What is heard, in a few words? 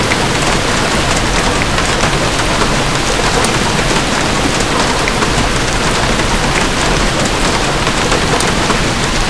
rain; blackshades